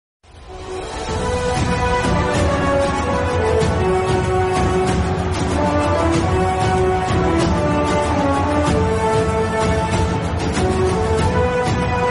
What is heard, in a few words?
emotional bgm; ms dhoni the untold story